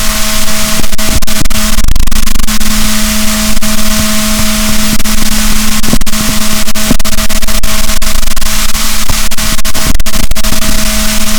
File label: rorquals negatif, boat